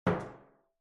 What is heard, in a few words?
Metal Footfall; soundscrate